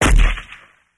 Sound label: Ak47 Gun Shot Sngl